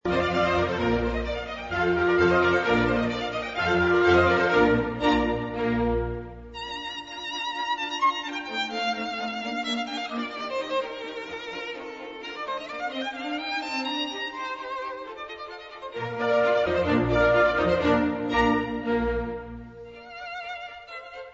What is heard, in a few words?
Violin Audio